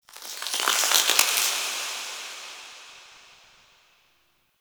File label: Crypt Stone Crackle B; Ambience; One Offs; Champion Crypt